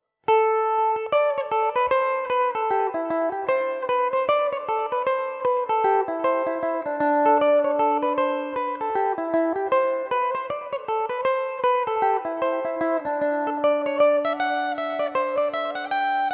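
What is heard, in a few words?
D mixolydian, irish mandolin; kitty in the lane; belmuse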